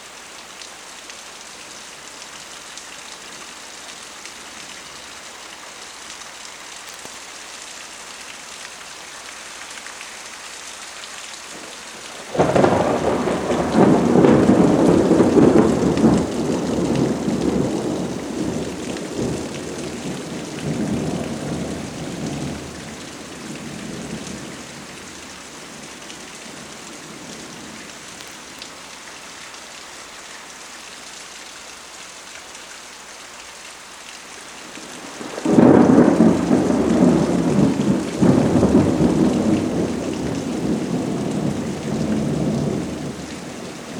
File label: Rain with Frequent Heavy Thunder